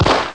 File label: GUN